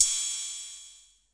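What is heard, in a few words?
DR110 cymbal, Boss DR-110 drum machine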